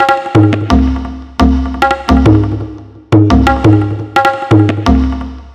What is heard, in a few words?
Tabla Effekts, percussion loops; KJ Sawka; Drum n Bass & Beyond; Drum Tool Box